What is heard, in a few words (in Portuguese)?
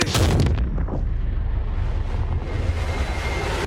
porra com explosao e fogo